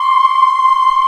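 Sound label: C5 child ah left, Choir; USB Soundscan vol.28; Acoustic & Synth; AKAI; CHILD AHF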